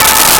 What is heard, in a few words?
Tub Bell A; BGM; Nintendo; July 2020 Gigaleak; DrMarioSound